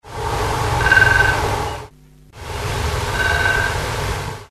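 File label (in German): specht, woodpecker; nistkasten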